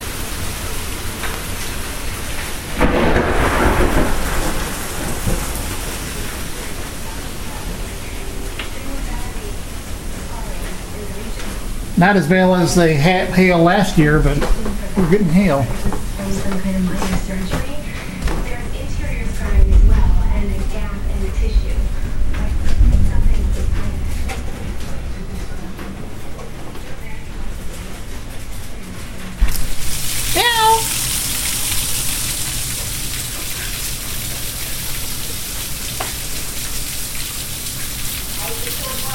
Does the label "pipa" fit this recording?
No